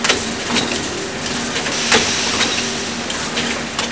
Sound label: factory; The Elder Scrolls III - Morrowind; Morrowind GOTY GOG